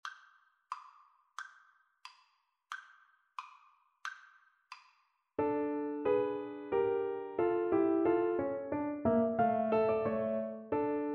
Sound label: grandmother tells a ghost story, oboe